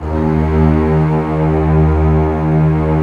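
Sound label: strings, contrabasses arco, orchestra; String Master Series; Cbs2 Orchest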